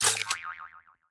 spin Button Click; netent; whenpigsfly

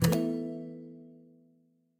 spin Button Click; kingofslots

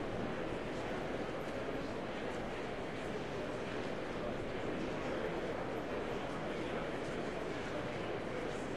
ambience General; netent

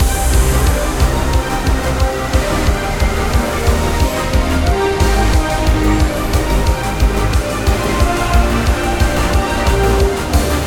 big Win Loop; netent; multipliermayhem

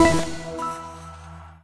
quick Spin; netent; twinspin

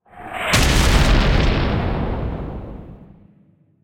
bomber bomb blast; netent

